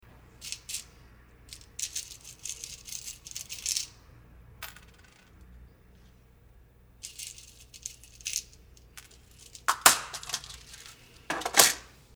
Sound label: craftsmanship